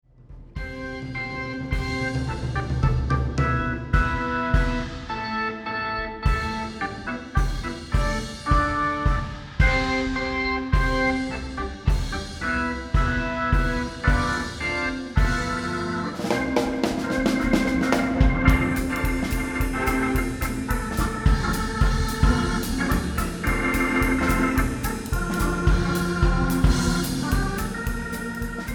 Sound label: Hammond